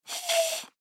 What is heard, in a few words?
Clarinet Play, Clarinet Struggle Blow Air; Cartoon; Musical